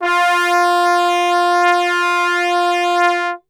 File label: F 2 Brass Super Section; Best Service; TRMBSTRGHT; 90 s Sample CDs